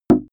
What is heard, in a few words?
plastic bottle put down deep